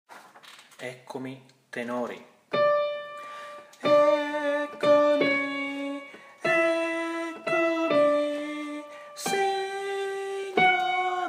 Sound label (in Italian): Tenori; Eccomi